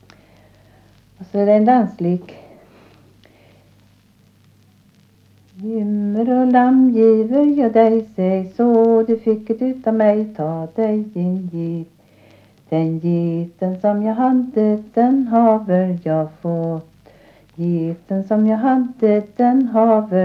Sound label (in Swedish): danslek; Jymmer och lamm